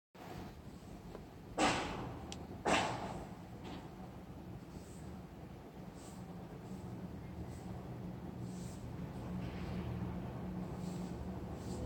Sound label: Wet Dirt